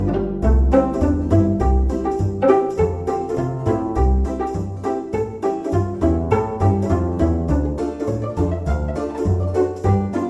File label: Pizzicato